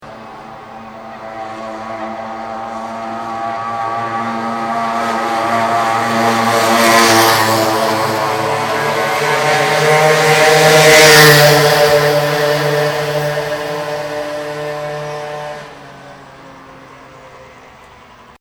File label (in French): doppler cyclos